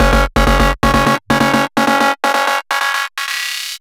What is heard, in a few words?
Melody 128 BPM Root F#; Vengeance Samples; Melody Kits